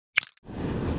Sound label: molotov pin pull; czero